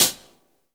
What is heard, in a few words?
hihat, house